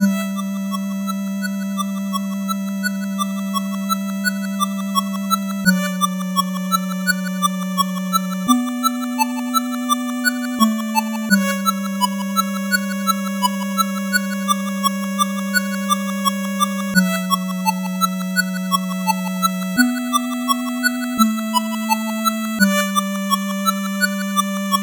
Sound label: 8bit bgm slow